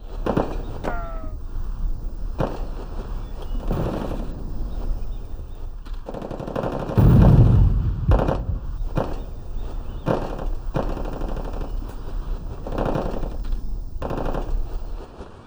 Forest; Ambient

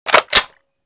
clip out; WarRock; Scorpion

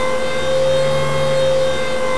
turret rotate

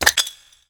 remove pin, Grenade

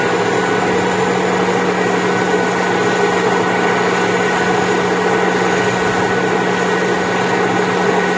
Engine, M1a1; WarRock

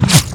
bullet air

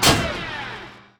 57mm Horizontal Stuck

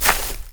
walk grass, FootStep; WarRock

